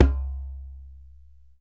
DJEMBE, African Perc

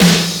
Snr 80S; Zero-G; Soundclash

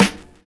VINYL SD, Drums Dance & Groove

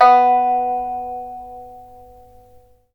PIPA B4; Spectrasonics; Heart of Asia

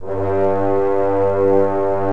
WINDS & BRASS

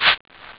HIHAT; Amiga Soundtracker Sample Packs

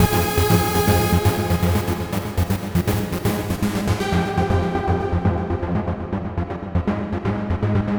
Trance Synth

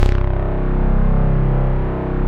OB BASIC C

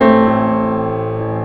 PIA, PIANOS; USB Soundscan vol.02; AKAI

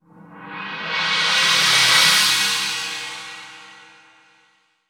China Roll, Cymbals; Roland BK-5

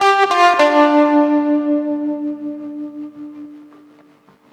FX03, FX guitar; USB Soundscan vol.31; Miro s Grooves; AKAI

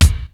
MPC 60

